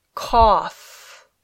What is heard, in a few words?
cough